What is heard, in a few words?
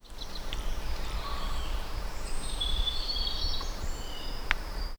boar in forest hurting; arrow